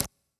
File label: reels stop; Fenix Play 27 Deluxe; wazdan